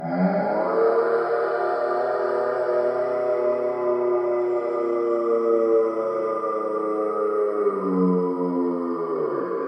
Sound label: Monster Screem Calling Other Monsters